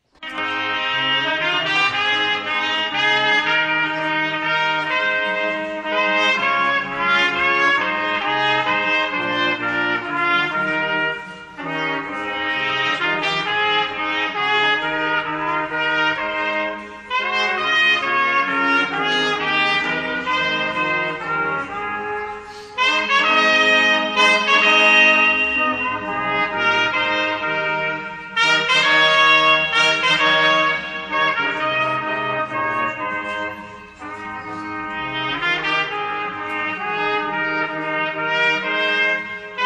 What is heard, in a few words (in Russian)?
Духовой оркестр; Хонженково; Молодежное общение